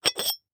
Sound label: Household Jar Glass Jar Open